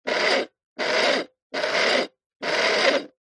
science fiction robot tracks wheels whirr several short bursts; zapsplat